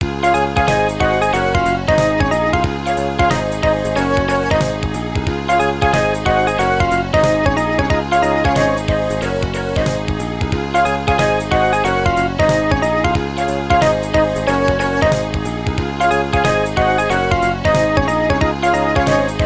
interlude; phone; twin spin

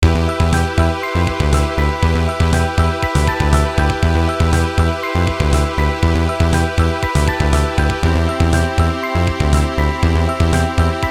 title & menu, phone; cross arena

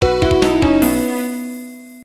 level failed; phone

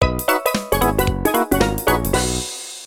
level completed, phone; fruit factory 2

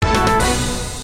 race finish; phone; edge rally

